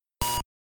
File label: hurt